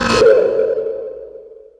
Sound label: cave siren loop, ambient; Half Life 2; Episode 2; outland